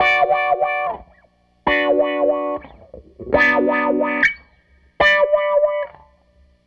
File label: SG Guitar Loop